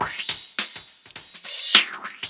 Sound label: Old School Break, Drums